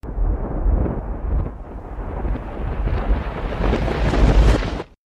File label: backwards lightning